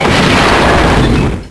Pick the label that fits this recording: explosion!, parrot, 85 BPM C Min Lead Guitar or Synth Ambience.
explosion!